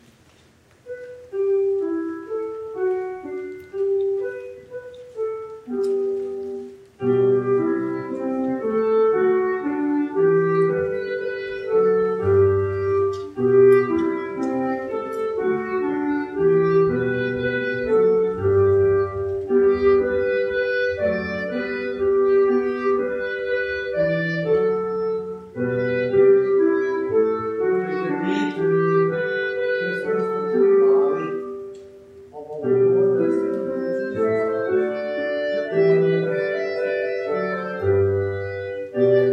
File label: instrumentalist